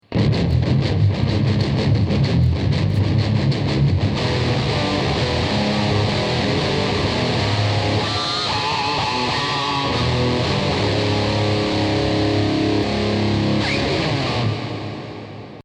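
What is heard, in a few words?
cosmic detune; VG 88 v2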